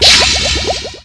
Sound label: SUCCES, JINGLES; MOBICLIC41